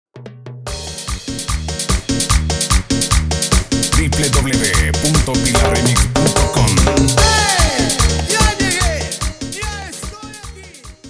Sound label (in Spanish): Instrumental con Animacion